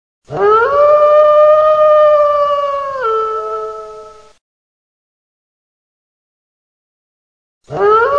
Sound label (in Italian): LUPO RUSSO